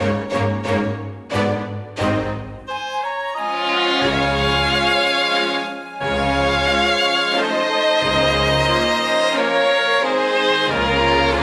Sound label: A Waltz; paper mario the thousand year door complete